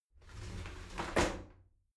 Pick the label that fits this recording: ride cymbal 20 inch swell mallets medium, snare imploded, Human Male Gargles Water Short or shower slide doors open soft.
shower slide doors open soft